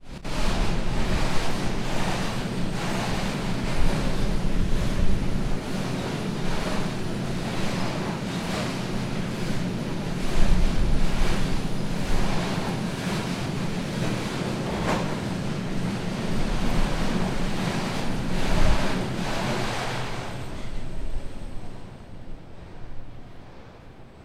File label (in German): Gueterzug